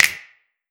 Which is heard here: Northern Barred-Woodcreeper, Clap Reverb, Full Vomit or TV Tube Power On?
Clap Reverb